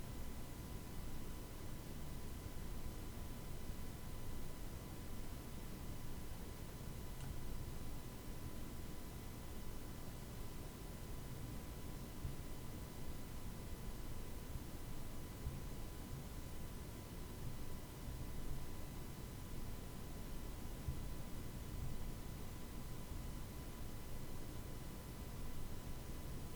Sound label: Noise test